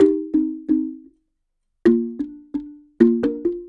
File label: Marimba 130bpm C, African; Quazibeat; African Secret